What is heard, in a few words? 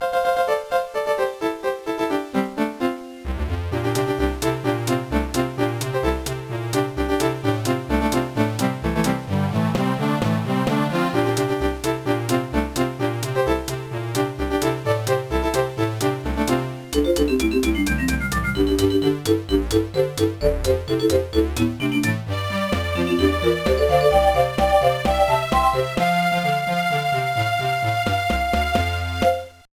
BGM; super chinese 1 2 advance; suchi labyrinth youma gundan no gyakushuu; gba